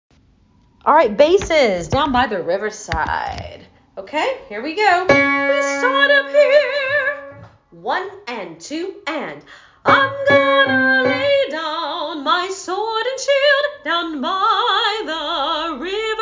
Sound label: Down By Bass